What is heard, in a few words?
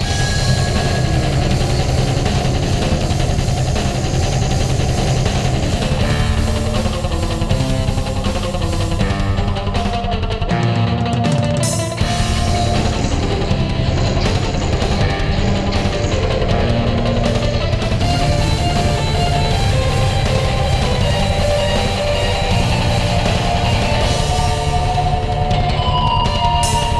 death metal radio